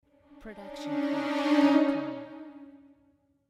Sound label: middle dissonant cresc